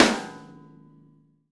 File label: SNARES, Drum Kits